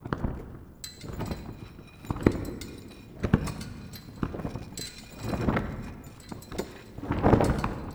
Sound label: Prop Flag Flaps; Warframe; Clan Dojo; Dagath